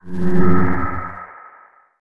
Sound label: hand glow; Earth and Beyond; EA Games